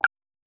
a navigation sound effect

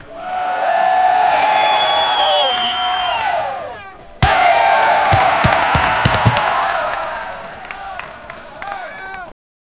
basketball asmr

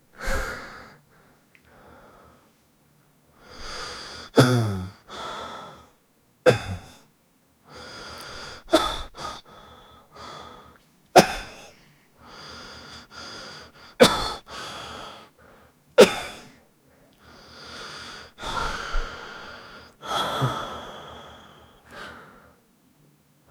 18 years old man holding, mouth closed

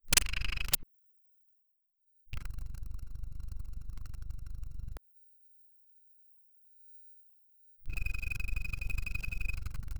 clean medical injector, electronic beep

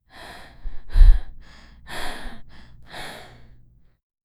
people gasping

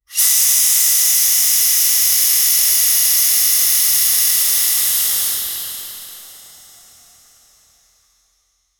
steam valve releasing sof

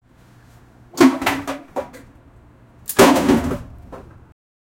everyday actions

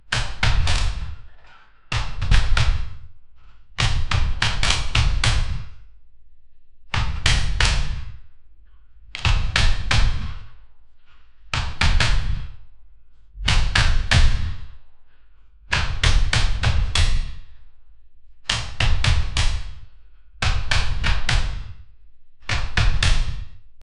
modern and very fast phon

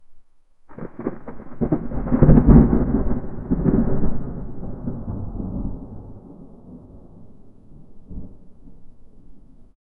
pure realistic thunder so

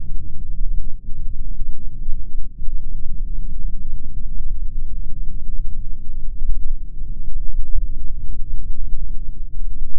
very subtle low frequency, underwater

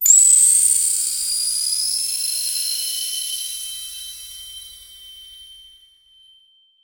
water power up pick up

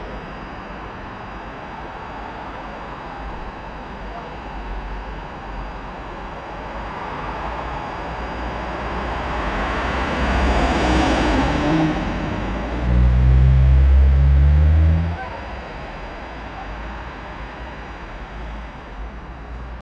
city traffic people car

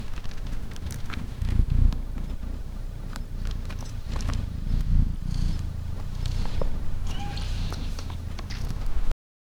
cat yowling and leaves

